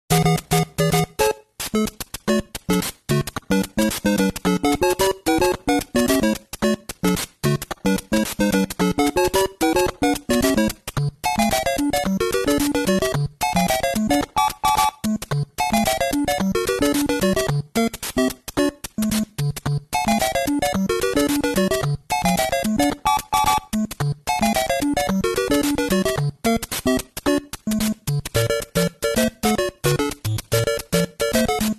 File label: Mobile Ringtone